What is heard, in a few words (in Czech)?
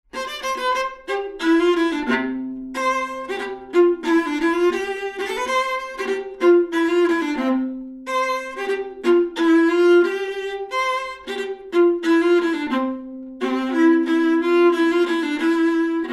melodie; koledy